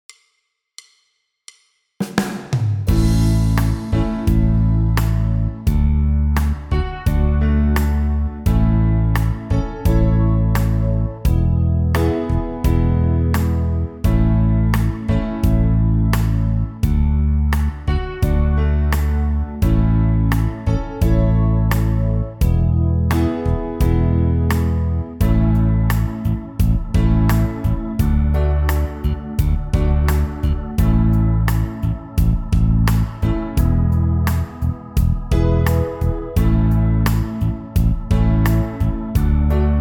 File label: JAM Live 8 Beat, backingtrack guitar